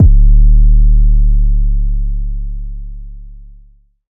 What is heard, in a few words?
Bass